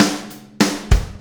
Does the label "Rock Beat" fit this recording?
Yes